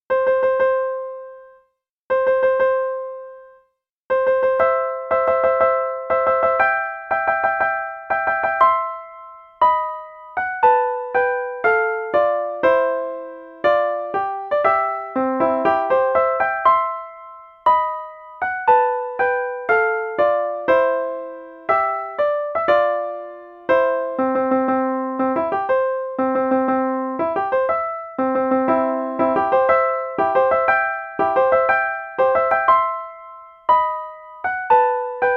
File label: Wedding March Solo; Felix Mendelssohn; Midsummer's Night Dream